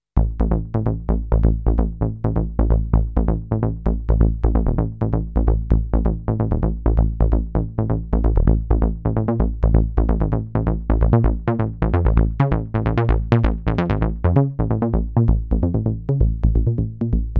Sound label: syn bass